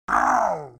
zombie death; ZombieBattle; audio zombie

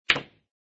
collide; wanNingTaiQiu

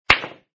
collide; wanNingTaiQiu

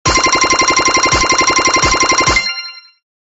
turntable; ZombieBattle

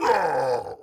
Boss Zombie Die; ZombieBattle; audio zombie

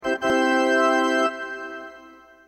flag; ZombieBattle

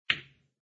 collide; wanNingTaiQiu

